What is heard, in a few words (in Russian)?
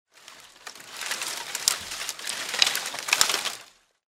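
шевелят ветками собирая в кучу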